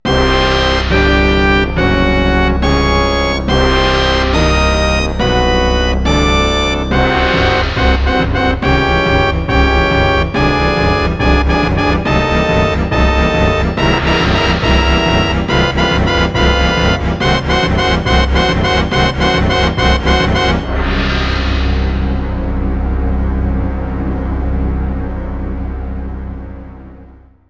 Game Over; Donkey Kong 64

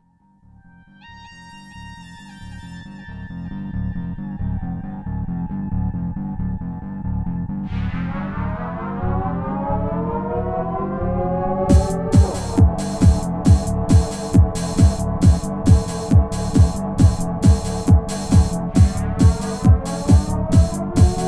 Klaxon Beat; Valve Collection; Half-Life Soundtrack

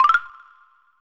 Pick Up; SFX Bottles' Challenge; Nintendo 64; Banjo-Kazooie

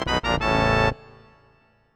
Talon Torpedo Fails; Banjo Tooie

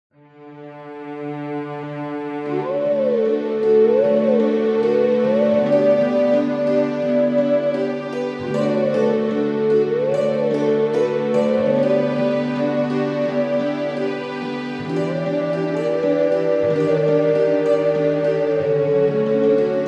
Howling Stone - (Duo); Legend of Zelda, The : Twilight Princess

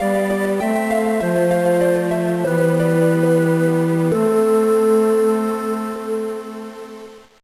Game Over; Castlevania Anthology; Castlevania - Circle of the Moon

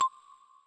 Multiplayer Lose Health; Banjo-Tooie

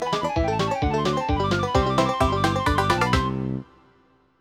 Complete Extra Honeycomb; Banjo-Tooie

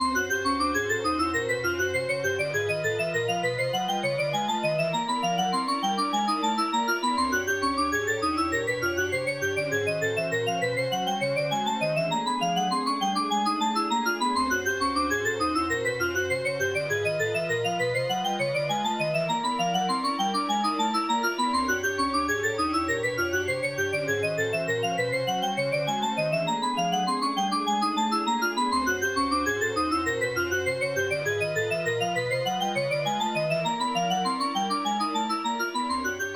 Looping Steps; Super Mario 64

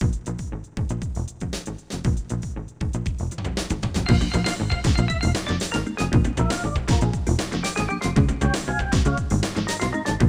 Bonus Game; Super Smash Bros

